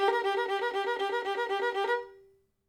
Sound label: violin